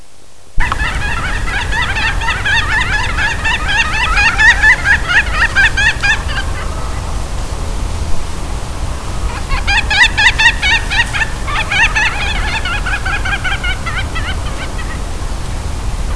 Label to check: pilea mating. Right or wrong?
Right